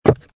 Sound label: footstep wood run; Casanova